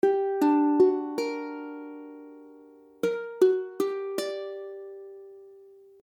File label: Gmmaj7, akordy ukulele